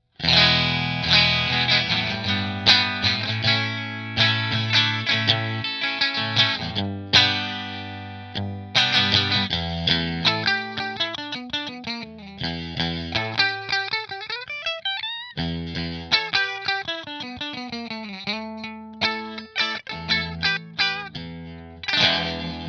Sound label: Tokai Strat Position 3 Fender, guitars